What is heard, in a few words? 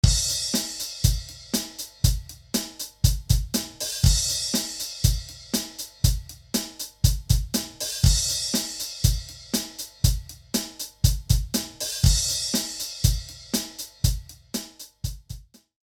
yamaha ms60, drum